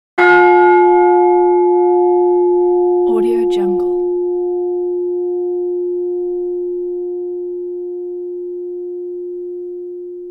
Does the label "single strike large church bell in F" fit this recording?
Yes